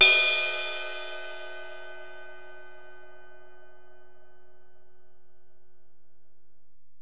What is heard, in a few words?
Ride, Alesis DM-PRO, Drum Machines